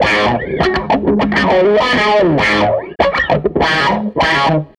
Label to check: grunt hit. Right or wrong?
Wrong